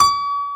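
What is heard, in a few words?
dance piano C#5; Best Service Advanced Media Trax Modern Composer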